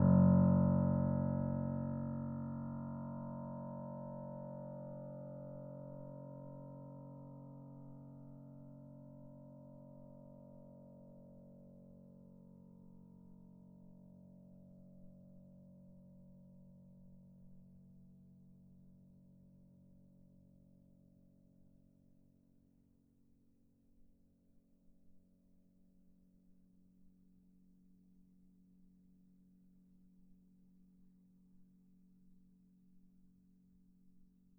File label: F#1, bitKlavier Grand Binaural